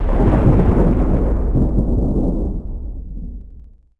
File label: thunder